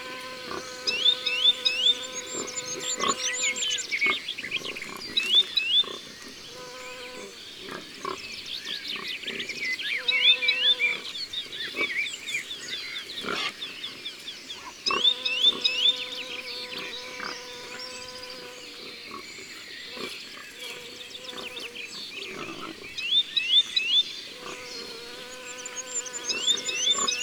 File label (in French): Sittelle torchepot; abc de la nature